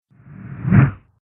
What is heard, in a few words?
Whoosh Slow Motion